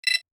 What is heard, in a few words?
terminal; sci-fi